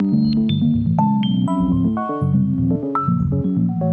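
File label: 122 BPM Sequence B major G minor Tonic B; innovative sequences 1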